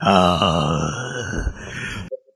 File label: growl male; ooh ahh